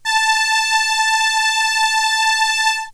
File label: Solina Viola A4; Analog Synths; Ableton Foundation FreePacks